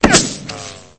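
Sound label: double ricochet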